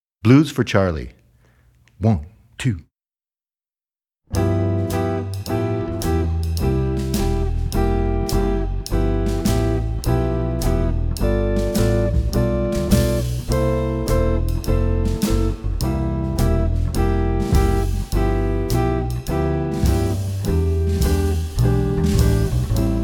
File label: Blues